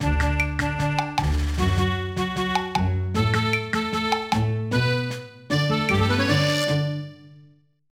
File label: Win; tales to enjoy three little pigs 2010 nds gamerip